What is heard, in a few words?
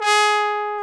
BRS BONE, Solo T.Bone; Roland L-CD702; Solo Bone 3